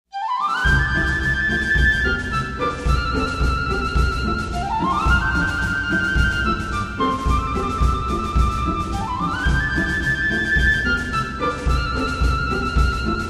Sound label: Music Bed Military, flute